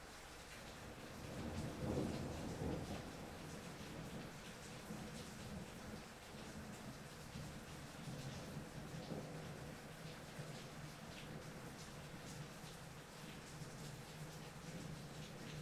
Thunder

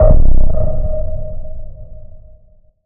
One Shot Sound

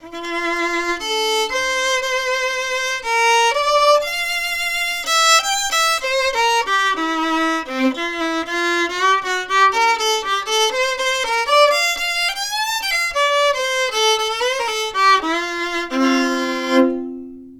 Violin riff

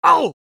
Male Fight

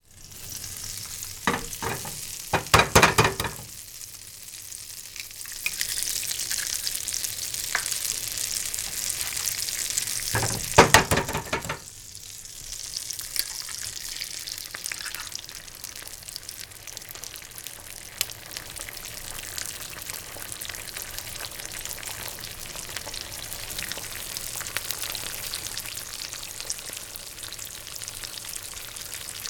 Crack 2 eggs And Fry

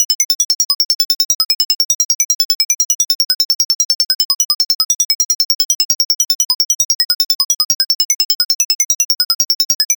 Computer Chatter

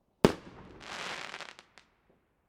Starburst Firework

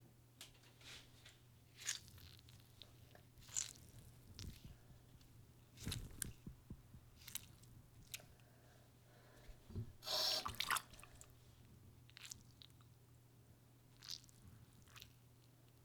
Wet Squish

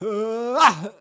Male Attack Grunt

